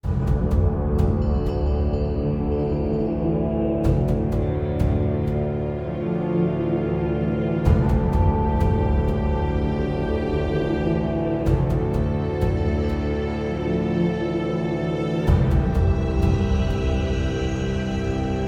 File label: Defeat; rwby grimm eclipse